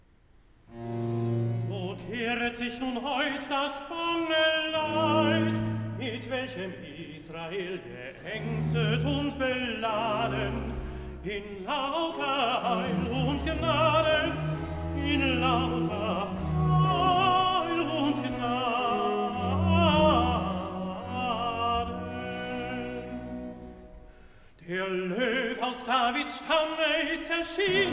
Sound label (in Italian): Recitativo (Tenor); Xmas Day; Cantata, BWV 63 'Christen, ätzet diesen Tag'; IV. Recitativo (Tenor) 'So kehret sich nun heut'; Bach; Weimar; Leipzig